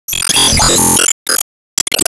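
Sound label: Sound Effect System Card Image Projection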